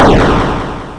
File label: bomb explode; Columns Max 1.1